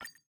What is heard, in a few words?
btn; xianzhou cruise